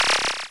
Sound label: Starpower, Retro Game Weapons Sound Effects